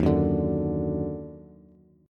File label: Eb Minor Major 7th, down, Bass; strummed; chord; JGuitar